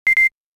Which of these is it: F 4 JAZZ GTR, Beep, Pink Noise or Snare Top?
Beep